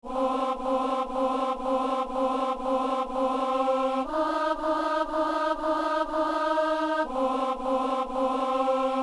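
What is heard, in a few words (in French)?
ténor; messe de l'ermitage; kyrie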